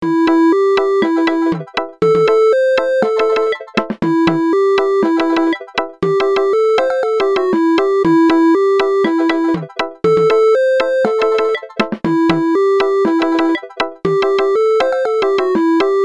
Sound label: Ringin Reggae